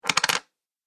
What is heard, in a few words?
Coin Wobbles On Hard Surface; Money Sound Effects